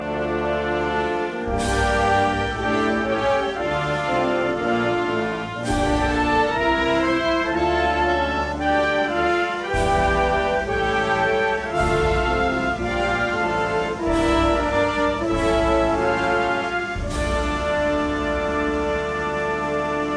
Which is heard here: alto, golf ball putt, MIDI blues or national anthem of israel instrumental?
national anthem of israel instrumental